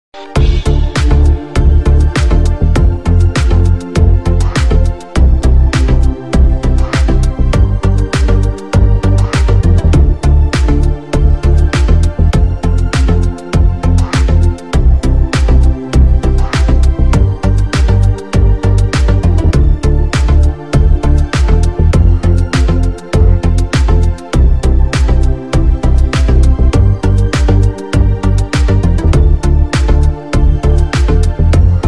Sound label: ringtone instrumental